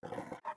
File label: wolf breathe run